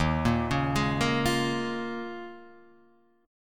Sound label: Eb 7th Suspended 2nd Suspended 4th Eb down Guitar Half Step Down; Eb7sus2sus4; arpeggiated; JGuitar